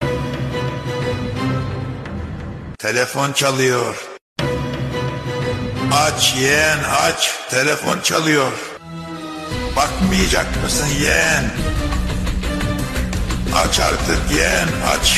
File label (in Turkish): Telefon çalıo